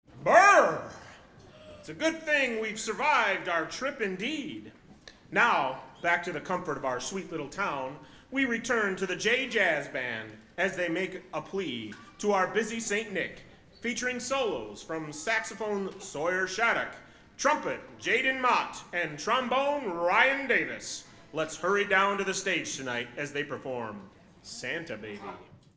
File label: Jazz Intro